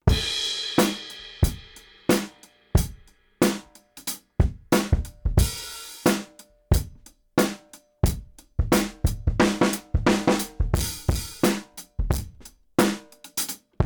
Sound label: drums